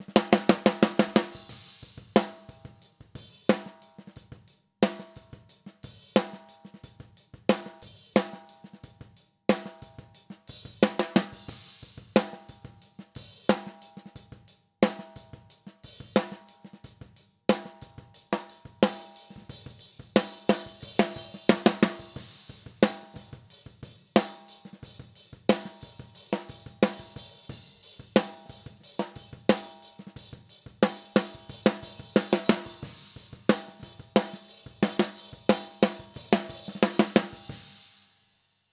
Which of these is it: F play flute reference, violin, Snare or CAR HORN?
Snare